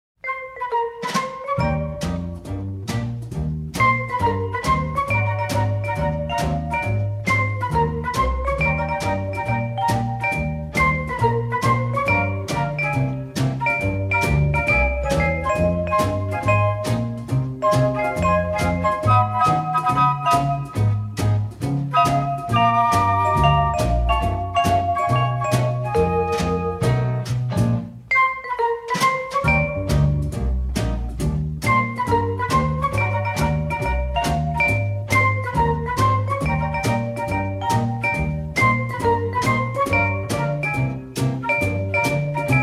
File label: Brass and Orchestra